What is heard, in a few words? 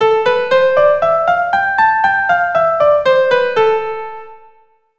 C Major Aeolian, keyboard